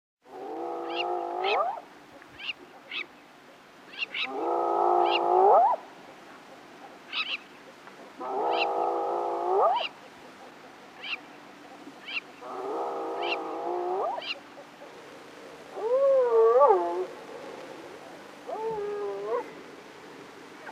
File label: Hyaena Spotted